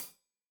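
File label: hat closed, hi-hat cymbals stereo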